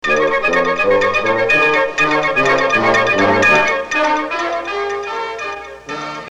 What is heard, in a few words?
news sounder no talk; WJMA